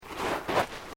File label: Footsteps Boots Sand Deep Step